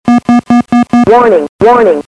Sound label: warning